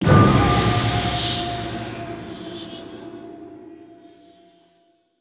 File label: Music Stingers - Battle Stinger; conan xbox 360 gamerip 2007